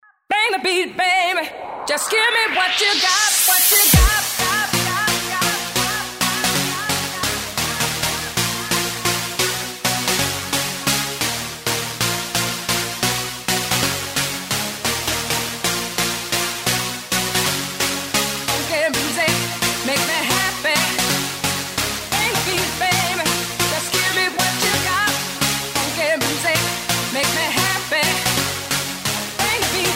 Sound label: electro mix